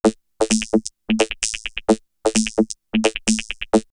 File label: Vocoder Loop; X-Static Goldmine; VocoderLoops